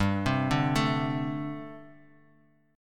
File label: G Suspended 2nd Suspended 4th, Bass Standard; arpeggiated; 15,15,12,14